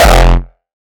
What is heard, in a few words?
Hardstyle Rawstyle Kick